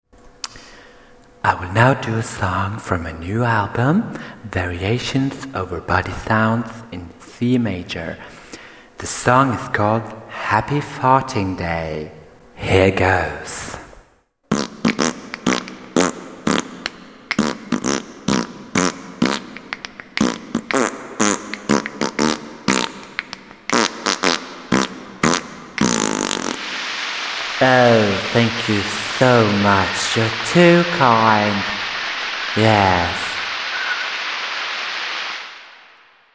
Happy Farting Day, Funnytones; Arphiola ringtones

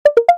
Success; LG LV8500 Wine

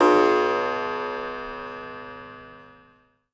Nonstop Clock, alarms; Google; Pixel-4; Reality Bytes

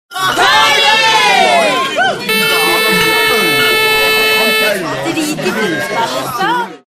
party; Arphiola ringtones; Greetings

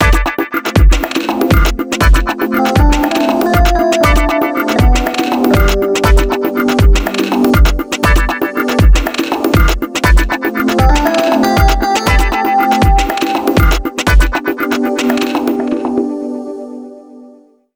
alarms; Rocksteady; HTC One M9

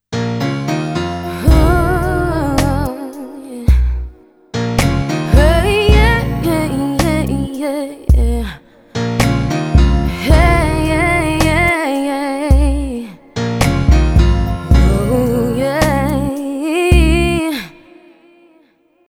Lovesounds Slow Jams; Sony Ericsson; PlusFourSix

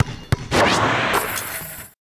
SMS

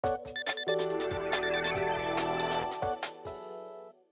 Beep

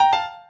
WiFi Call Disconnect; Microsoft Lumia-550

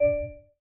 Class Harp

